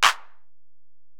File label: EPerc, Alesis DM-PRO; 300 Drum Machines